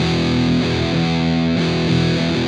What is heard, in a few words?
Padding, Guitars; ACID Music